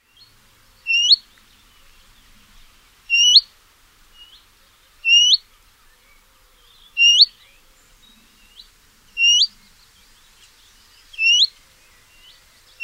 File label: willow warbler